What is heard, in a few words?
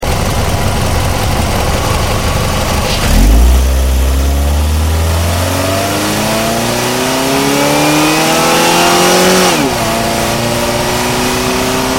Escape Acceleration Outside